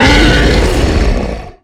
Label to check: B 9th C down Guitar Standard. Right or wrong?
Wrong